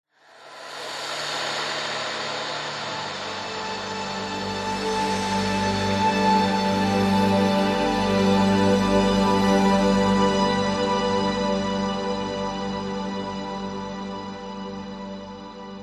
sound design cinematic swell musical warm glowing awe dusk